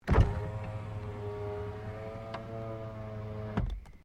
CAR SUBARU LIBERTY EXTERIOR WINDOW OPEN; Subaru Liberty 20 01; Family members Subaru; Vehicles

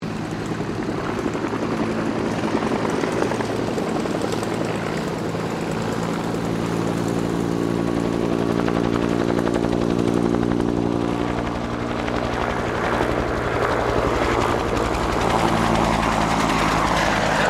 HELICOPTER 1967 BELL UH1 IROQUIOS PASS

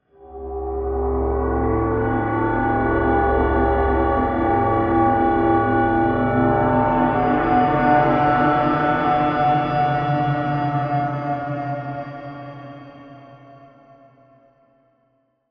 Bed Eerie Swell, Production, Element; Beds (Sound Effects:Sound Design); BlastWave

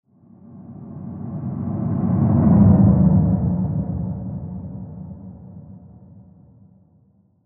Sci Fi Spaceship; Spaceship Pass By; Spacecraft

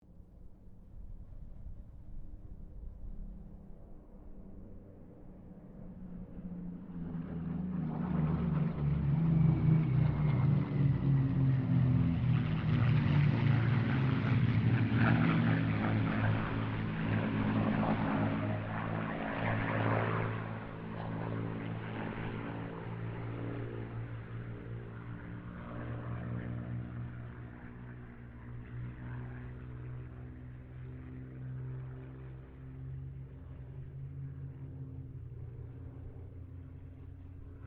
Spitfire Mustang Kittyhawk pass; Mixed aircraft squadrons; Airplanes

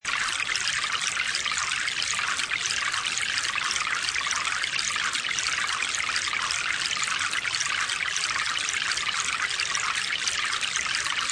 Stream High, Sound of a running stream; Stream High Pitch; Rivers (Sound Effects:Nature)

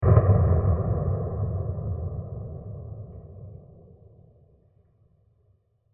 Huge Dark And Diffuse Explosion; Explosions (Sound Effects)

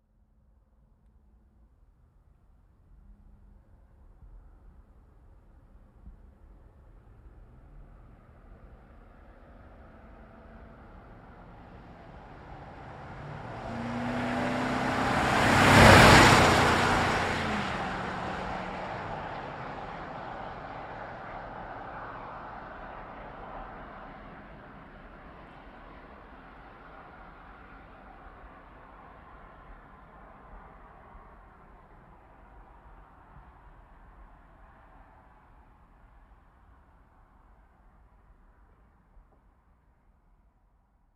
Truck GMC Savana By Fast Revving; 2010 GMC Savana; Trucks; Vehicles

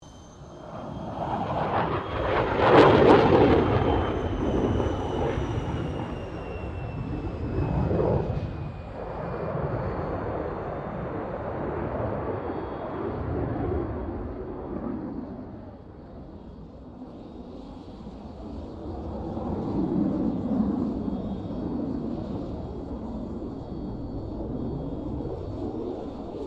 AIRCRAFT FA-18 HORNET PASS

